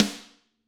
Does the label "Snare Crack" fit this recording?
Yes